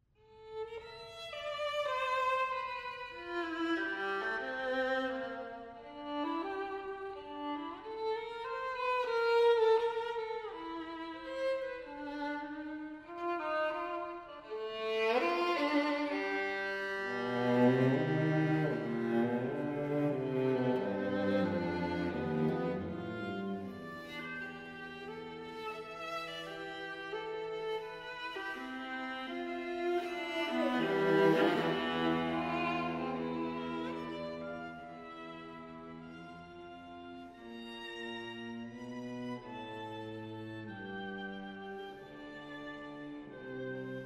String Quartets

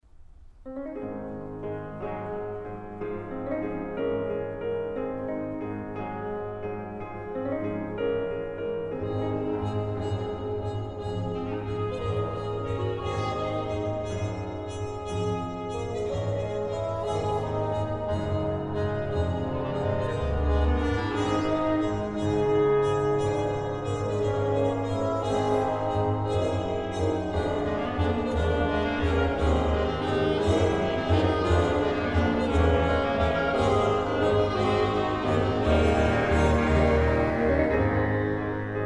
Oboe, Concertos; Dutton

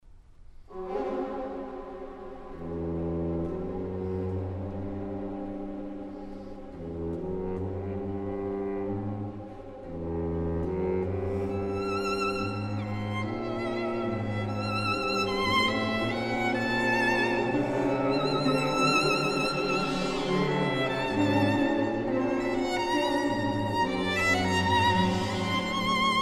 Violin, Concertos; Dutton

